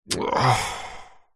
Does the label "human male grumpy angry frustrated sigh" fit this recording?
Yes